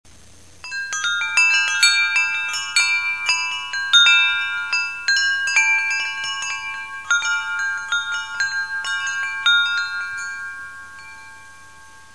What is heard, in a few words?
chime